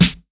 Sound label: studio hip hop snare sample a sharp key